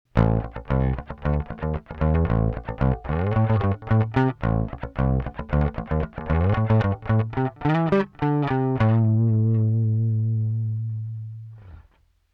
Walrus Audio Badwater Clean EQ Drive 50 Blend 50 MF